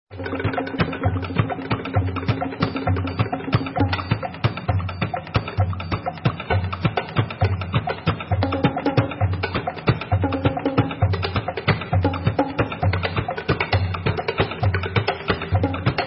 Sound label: Instrumental